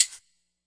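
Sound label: classic hip hop Shaker; Classic Hip Hop Mega Kit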